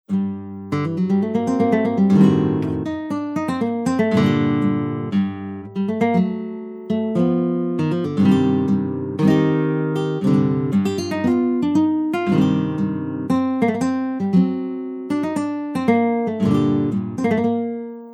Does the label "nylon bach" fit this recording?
Yes